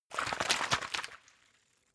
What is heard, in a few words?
bottle water shake short; berufe